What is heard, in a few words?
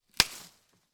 impact wood branch snap on knee